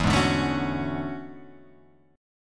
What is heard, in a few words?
E 13th, Guitar, Half Step Down